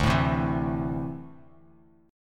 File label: D 7th Suspended 2nd, D7sus2 chord; D7sus2 strummed